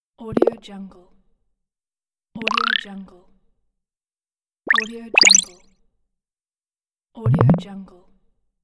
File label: game warm fx